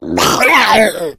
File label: female boomer shoved; player; voice; source engine; left 4 dead 2